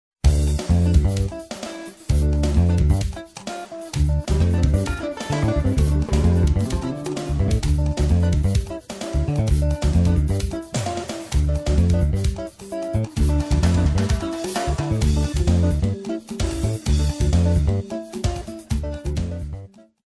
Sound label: jazz pop